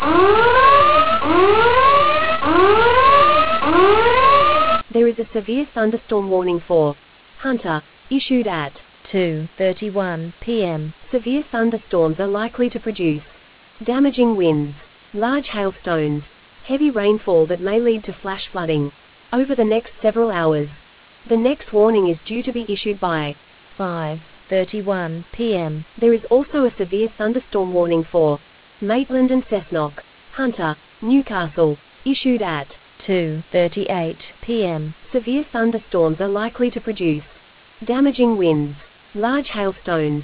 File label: storm audio